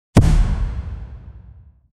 chest opening sound epic

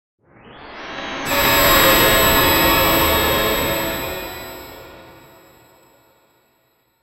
glittering; in a vibrant 3d animated

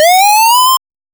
cute death sound, game over

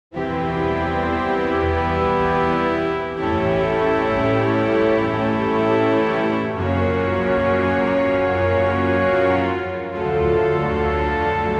military naval promotion; authoritative

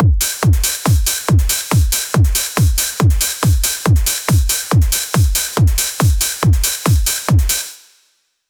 drum trance

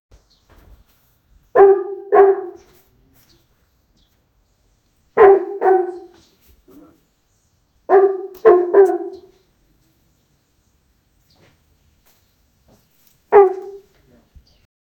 farting with dogs voice; quirky

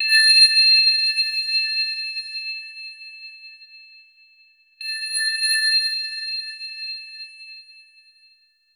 soft 3 note chime digital

